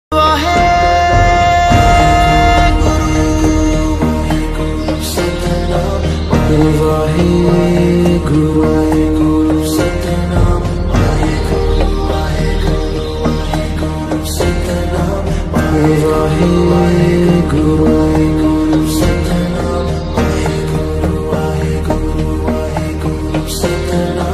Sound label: punjabi ringtone